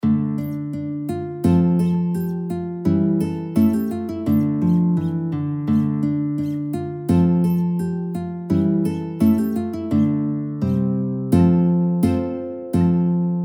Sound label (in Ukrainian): bandurka; Kopav kopav krynychenku